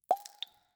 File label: meditation